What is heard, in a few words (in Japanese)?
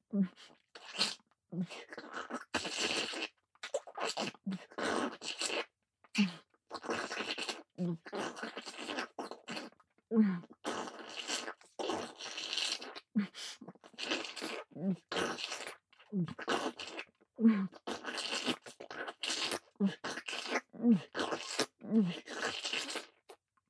フェラチオ・激しい, ASMR; loop音声